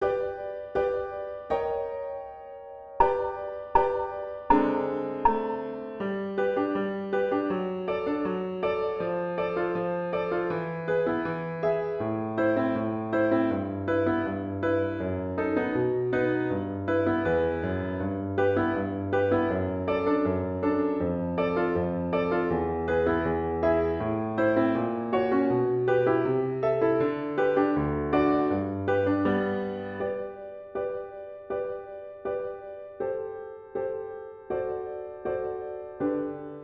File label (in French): polka violon